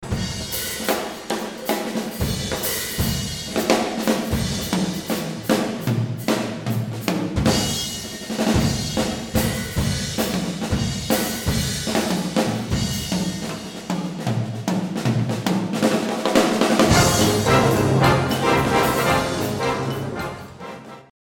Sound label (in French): extrait batterie